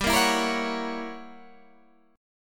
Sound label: F sharp 7th G, chord; strummed; JGuitar